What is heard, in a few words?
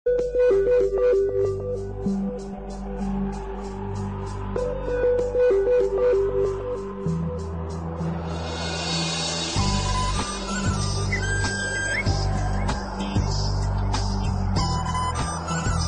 romantic moving guitar wires musical tone; galaxy ringtones